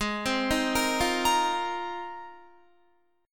G sharp 6th Add 9th, Guitar; G#6add9; arpeggiated; 4F,4A,5C,5G,5C,6E; 15,15,15,15,17,18

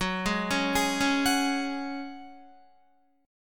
Gb Suspended 2nd, Guitar 4E,4A,5D,5G,5C,6F; Gbsus2 arpeggiated

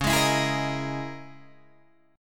C sharp Minor 6th Add 9th, Guitar, Drop D

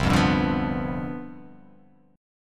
C Major 7th Suspended 2nd Suspended 4th

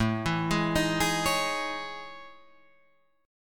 A Major Sharp 11th

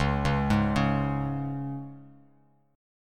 C sharp 5th, Bass Standard; arpeggiated; C#5; JGuitar

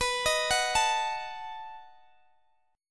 B 7th B down Guitar Open G

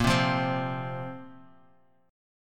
A sharp Suspended 2nd, Guitar; strummed; JGuitar; 5,3,5,5,x,x

